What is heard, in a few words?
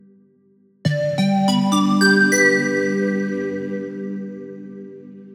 Glassy Bell, jup8